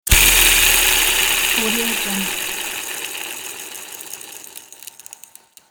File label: bicycle pedals spinning; electronplanet